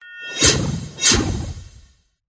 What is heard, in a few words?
cat attack voice middle